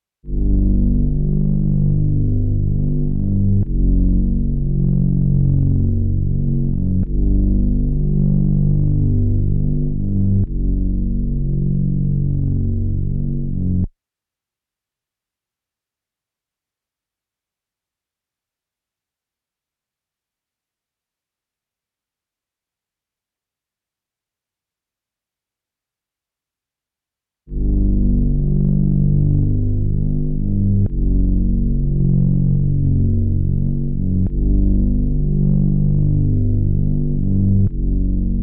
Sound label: 141 BPM B Min Bass; Melodies; Loop Stems; Cymatics; 2022 Melody Mystery Expansion; Aerials